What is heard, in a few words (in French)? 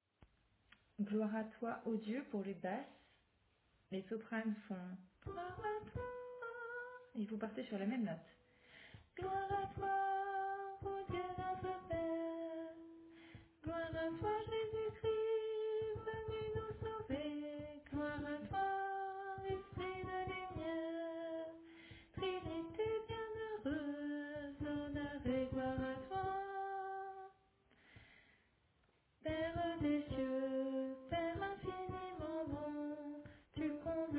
Basse